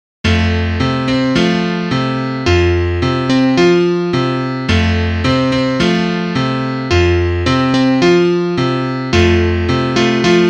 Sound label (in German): Klavier; Liederbuch; kleine jagdmusik